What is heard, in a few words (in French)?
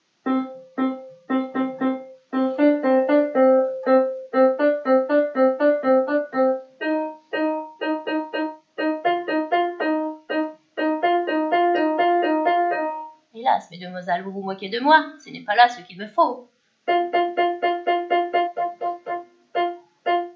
opera Cendrillon chant dis nous instr